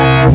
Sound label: laser